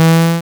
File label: wrong